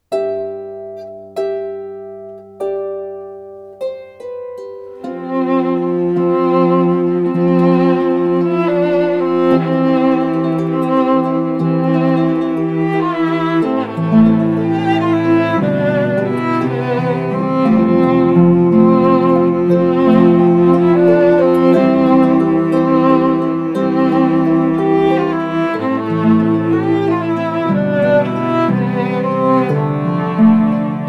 harp trio

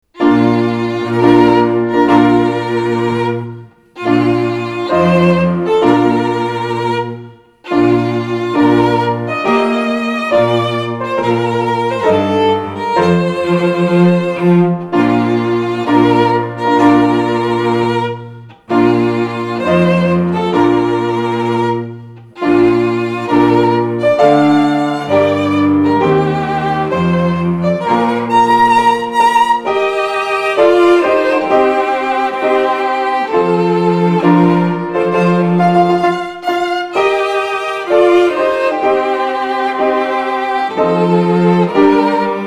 Bridal Chorus trio

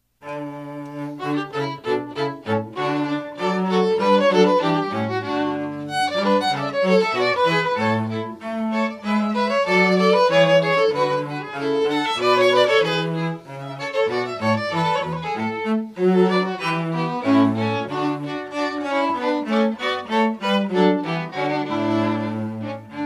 string trio

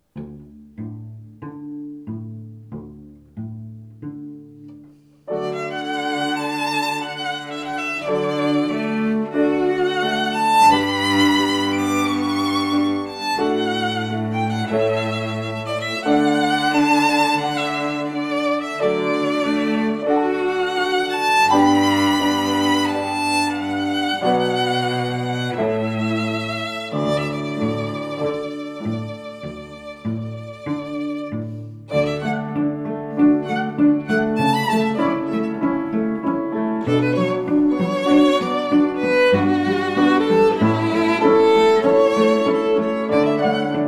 trio

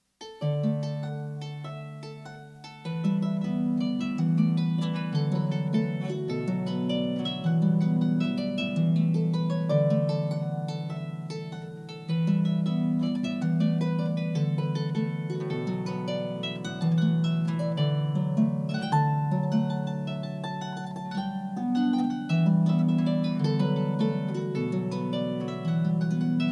harp

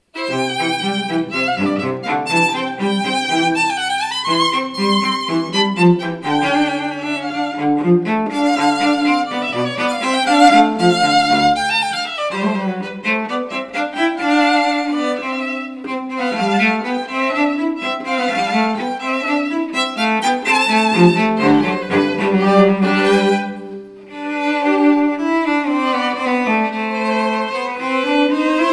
string duo; Beethoven

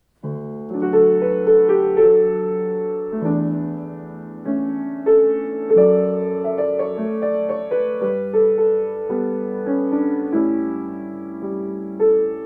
piano

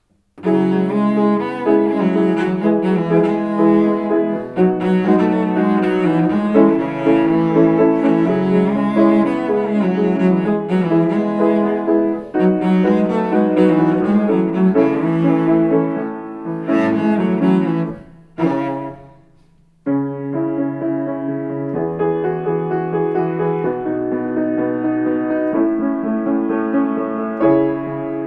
cello

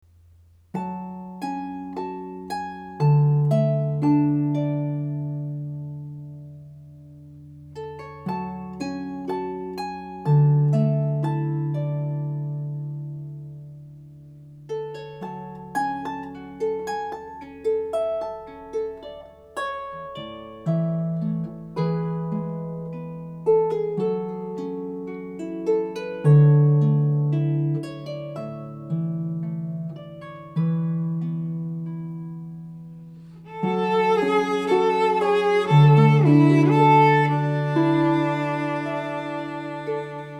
cello

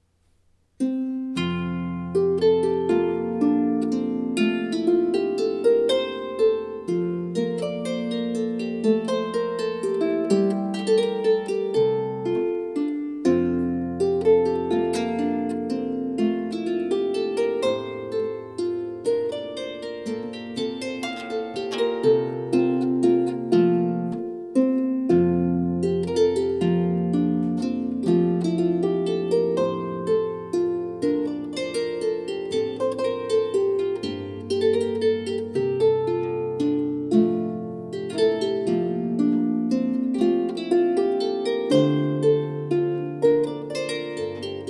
harp; solo harp

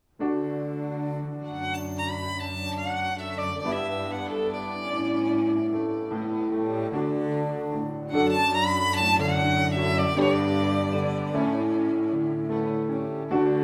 quartet

